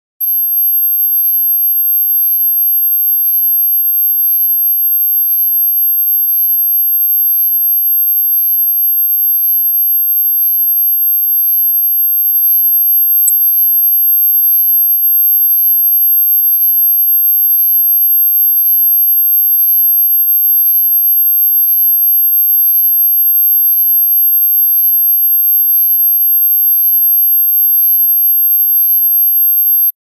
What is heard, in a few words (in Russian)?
ul'trazvuk pisk